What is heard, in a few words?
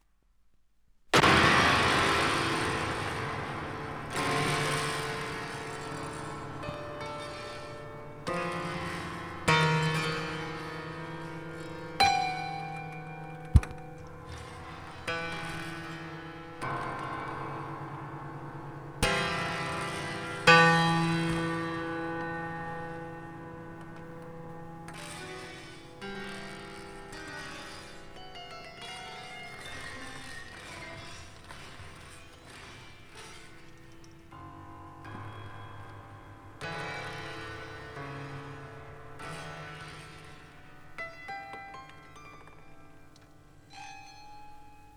pling plong